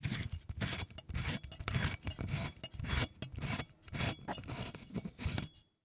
Technology Electronic Lamp Light Bulb Unscrewing From Lamp